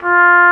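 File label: Trumpet F2; Digital Sound Factory Virtuoso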